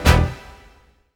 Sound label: HIT, Hits; Houseworx